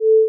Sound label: sine grain